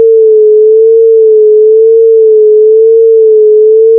pitch sine

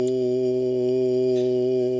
voice O

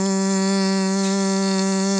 hum G

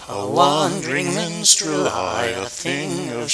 min duet

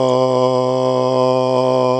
voice A

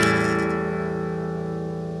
guit A7th

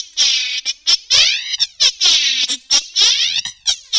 rec flange

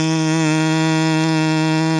hum E

